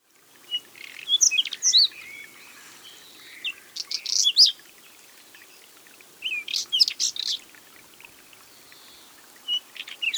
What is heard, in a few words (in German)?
Braunkehlchen